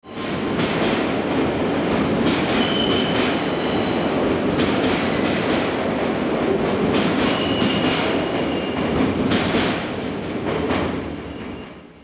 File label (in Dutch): trein op brug